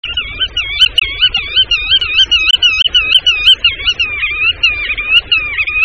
Barge Queue Noire; Scolopacides; La Barge à queue noire; Oisellerie Galan

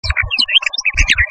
Pie Grieche A Poitrine Rose; Laniides; Passereaux; Les Laniidés; Pies-grièches

